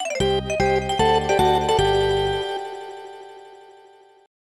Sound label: Lose; Super Mahjong 3; SNES